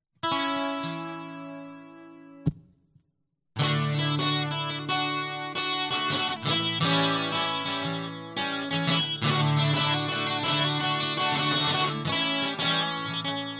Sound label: Guitar Loop